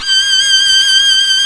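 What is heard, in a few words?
SOLO STRING G1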